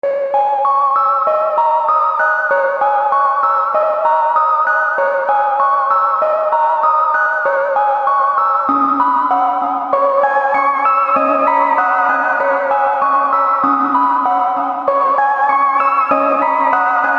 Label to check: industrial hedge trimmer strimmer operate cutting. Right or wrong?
Wrong